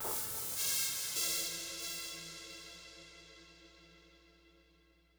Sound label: Synth; Hitman Blood Money